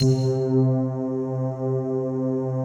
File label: PAD; Best Service; XX Large; Pads